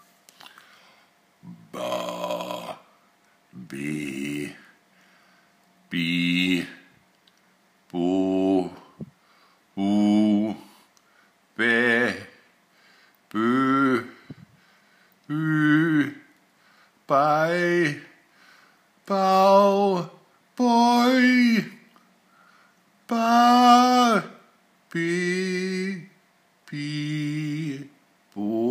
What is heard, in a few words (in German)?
Stimmübungen Frequenzbereich